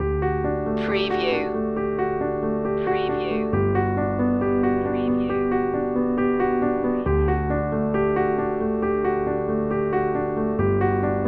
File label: forgiveness loop